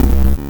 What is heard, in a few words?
Bass Mega Thud